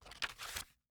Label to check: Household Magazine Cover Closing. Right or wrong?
Right